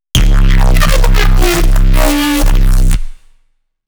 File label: reese C, bass